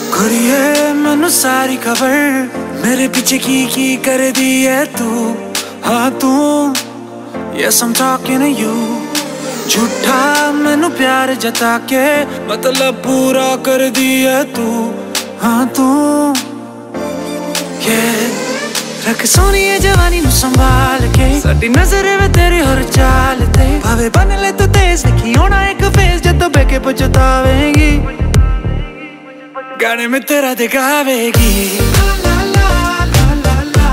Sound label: Male Version Song Ringtone; ringtones